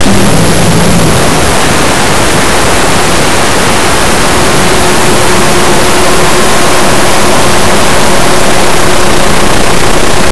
toilet; Blue Heat

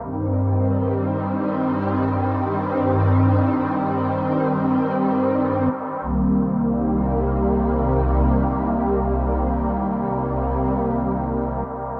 synth pad ambient 160 C; Sphere

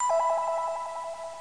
pling; growguide

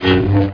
lt saber swing; MOTS

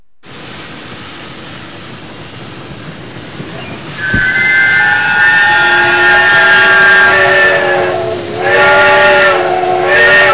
train

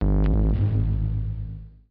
BASS SHOT HOUSE 125 A; eJay; Singleshots

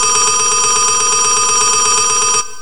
telephone

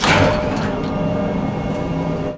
ship under close; Earth and Beyond; EA Games

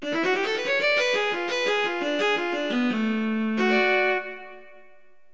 viola; Roland; An Invitation To The Roland World Of Music

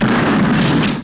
shotgun; angst

